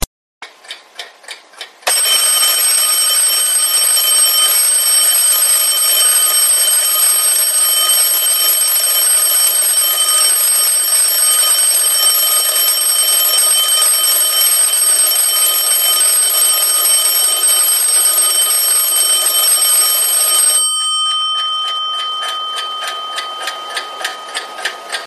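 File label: Noisy Old Alarm Clock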